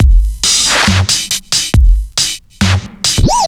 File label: Bpm138 Brick Break, dubstep drum loops